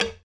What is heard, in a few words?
Gold Dig; Blockman Go; Android